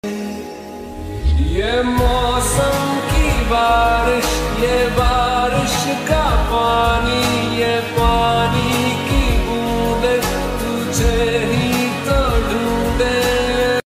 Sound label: slowed and reverb lofi